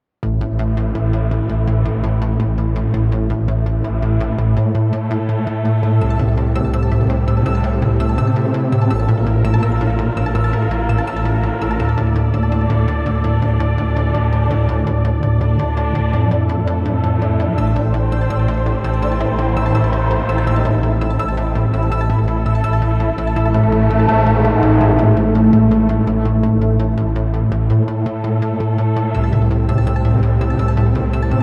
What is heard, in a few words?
tensionproject nofix nodrum traps; By Burak Sound Bank; tension gerilim vol 13